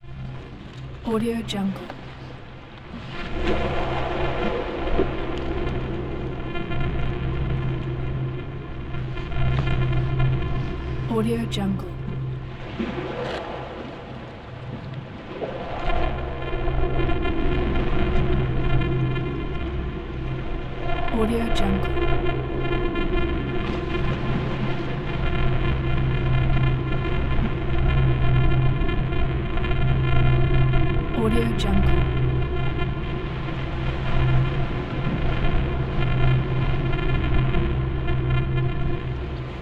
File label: space transmission; albasfx